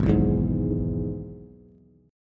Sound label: Ab Minor Sharp 5th, Bass; Abm#5 strummed; JGuitar